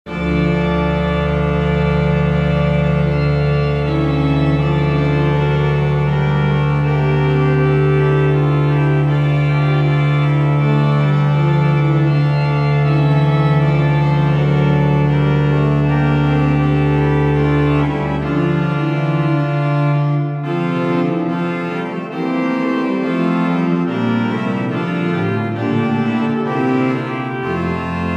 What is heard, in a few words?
Noel, Organ Music, Souvigny; Boely